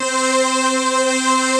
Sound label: AP SYNTHS; Pro-Rec - Synthsonic; JV ANALOG2